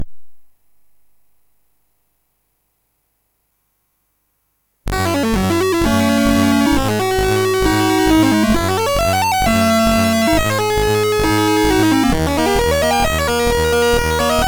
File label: Lead Attempt BASIC, MOS6581R3